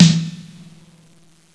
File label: Drum